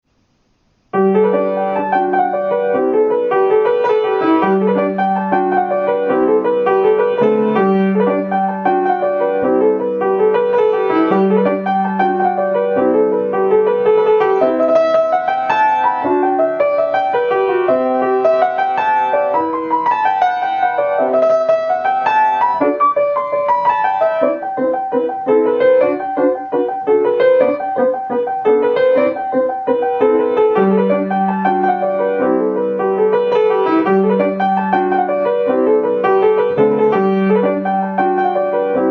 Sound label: Piano; Swerving for Bunnies